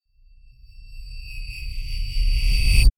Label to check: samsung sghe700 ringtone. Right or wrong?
Wrong